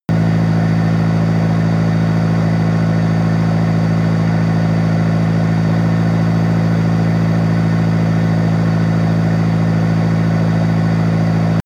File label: engine medium speed